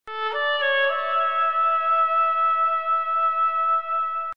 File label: sms ringtone